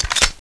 m950 clip out

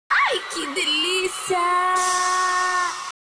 knife; cstrike

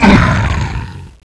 normal hurt, zombie; Zombie Darkness

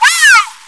whoo

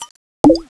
holy water deploy, weapons

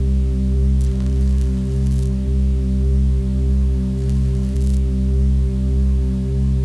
plasma gun idle

